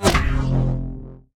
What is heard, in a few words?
proton fire; smite; zhongkui